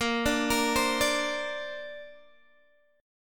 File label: Bb Major Add 9th, Guitar, Open D; 20,17,20,18,17,x